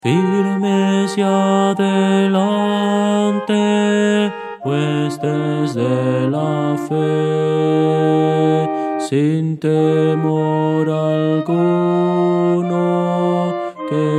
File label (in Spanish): contralto, Himnos Cristianos; firmes y adelante